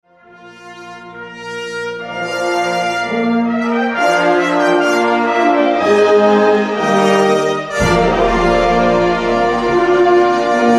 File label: Opening Fanfare